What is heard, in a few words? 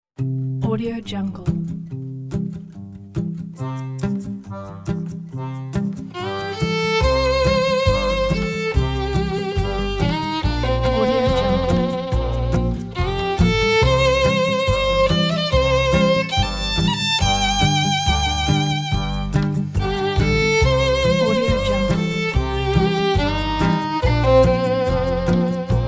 across the prairies guitar country ballad